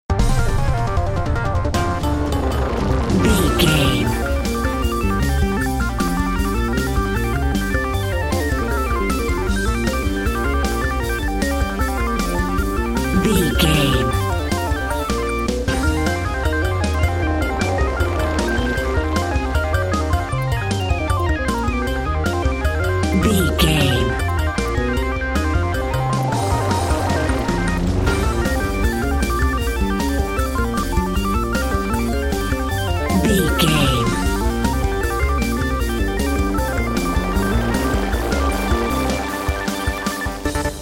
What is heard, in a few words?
video game boss battle